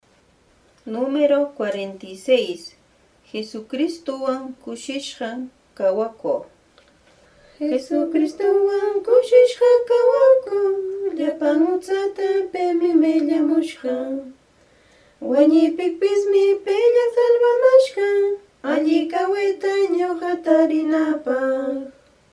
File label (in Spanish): cancion